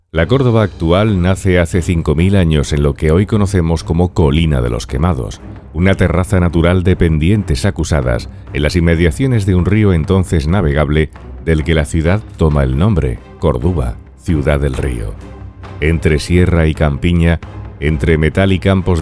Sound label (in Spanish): Ruta con musica; RUTA 1 “La Córdoba prerromana / Ruta Alcázar Viejo”